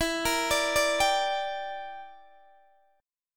E Minor 7th Flat 5th, Guitar, DADGAD; chord shape

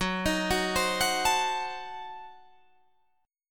F sharp Diminished 7th F sharp Guitar Drop D; 16,18,16,17,19,17

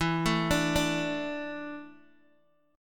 E 7th Suspended 4th, Guitar, DADGAD

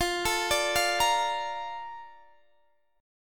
Bb Major 7th, F

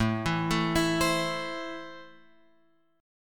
A Minor, Guitar, Open F; x,4,4,4,7,7; JGuitar

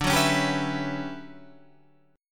D Major 13th D down Guitar Standard; strummed; JGuitar

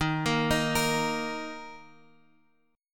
D sharp 5th; D#5 Chord {X 6 X 3 4 6} arpeggiated

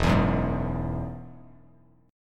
E Major Add 9th E down Bass Standard; strummed; JGuitar

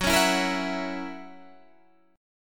Gb 7th Gb down Guitar Standard, chord; strummed; JGuitar

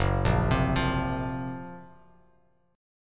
F sharp Major Sharp 11th, Bass Standard; 2,1,10,10; arpeggiated

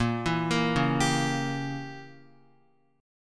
Bb Diminished 7th Bb, Guitar; arpeggiated; JGuitar

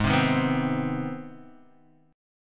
C Minor Major 7th Sharp 5th Ab

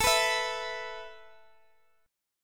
A Suspended 2nd A Guitar Open G

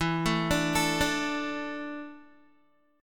E 7th Suspended 4th, Guitar; E7sus4; arpeggiated; 12,12,12,14,14,x

